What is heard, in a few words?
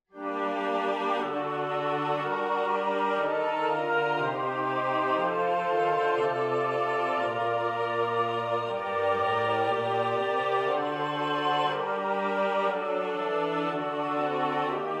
orquestrated style; korsakov problem 25.2 final version; schubert D872